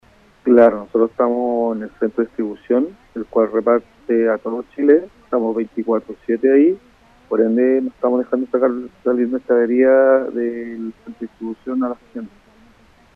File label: SAXOLINE